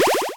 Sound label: heal